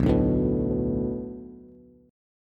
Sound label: B Minor 7th B Bass, chord; Bm7; strummed; 7,5,7,4; JGuitar